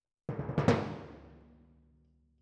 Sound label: Timp Close